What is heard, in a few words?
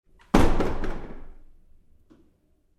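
Door Bathroom Stall Impact Close Up Bounce; Door,Bathroom Stall,Impact,CU,Bounce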